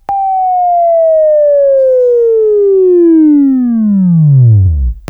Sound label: WAV Left, Sweeping; Sweeping Training program